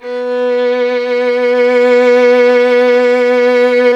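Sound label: string violin, Solo Instruments; Miroslav Vitous - Solo Instruments VOL-2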